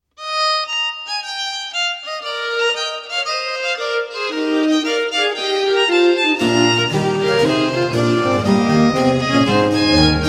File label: Intrada (Allegro), spoken; Mensa sonora Pars V (C 73); Simplicissimus Ensemble